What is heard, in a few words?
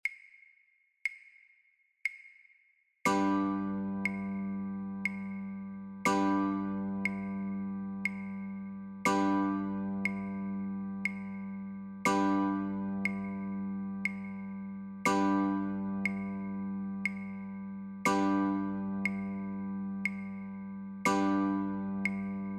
Chords Claves, Guitar